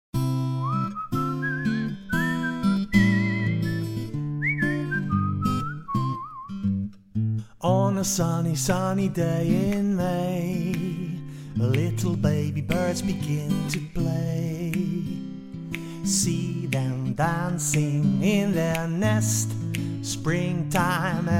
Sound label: Springtime song